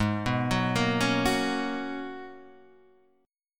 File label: G sharp Minor 11th G sharp, guitar chord; G#m11 Chord {4 2 4 3 2 2} arpeggiated